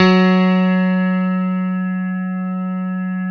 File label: CP-70 F#2; E-MU Formula 4000 Series Vol. 3; Analog Odyssey